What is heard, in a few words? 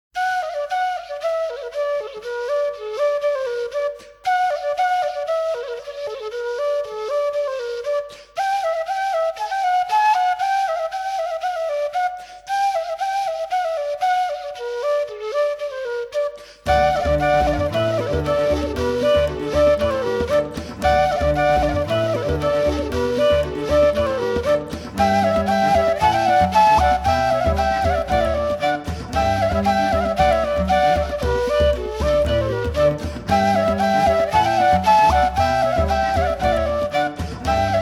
convivial melody